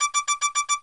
warning; general combat; Cosmic Rage

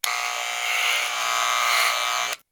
haircut; general house

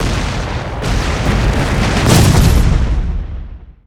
explode, combat suit; CosmicRage

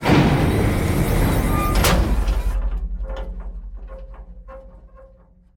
tank dock, ships; CosmicRage

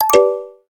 activate; Cosmic Rage; general comms

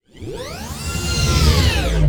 hangar; ships

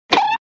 poke

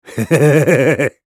male giggle

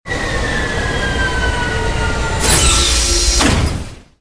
ship land

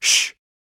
shush; CosmicRage; socials

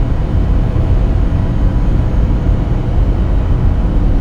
digger, ambiances; CosmicRage

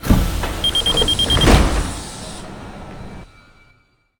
doors closed, trains

